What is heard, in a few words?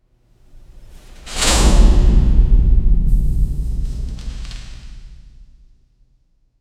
figures descending from a, clothing rustle